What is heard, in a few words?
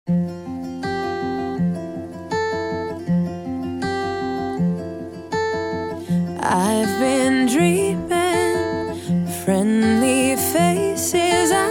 Level 1 Music